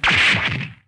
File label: Punch